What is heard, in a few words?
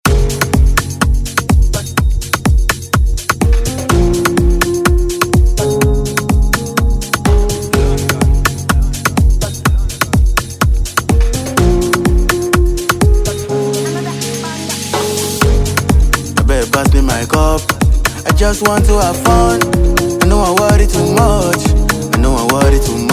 Afrobeat Redrum Intro